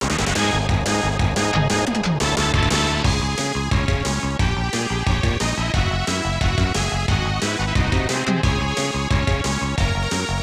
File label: Battle